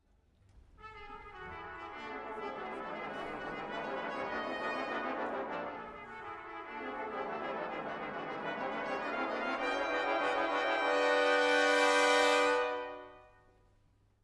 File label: toccata; toccata2000